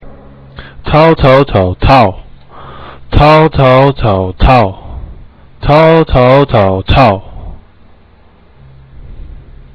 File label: tone; chinese culture